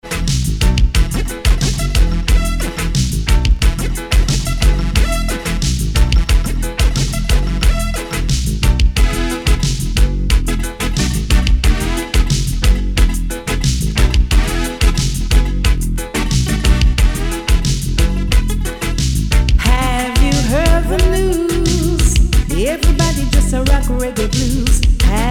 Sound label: Reggae Blues; Reggae, Ska, Roots, Rocksteady, Dancehall, Rhythm and Blues